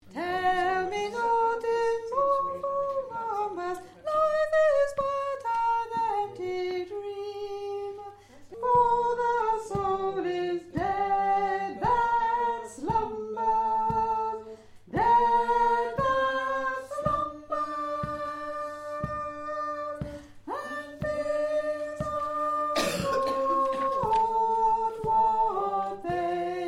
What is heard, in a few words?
Tenor